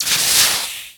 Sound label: Sizzle Laser Hit Water; SFX Kit; Creaks, Scrapes, & Misc